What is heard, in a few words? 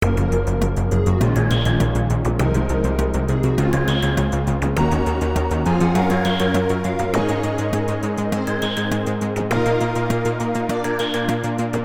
strings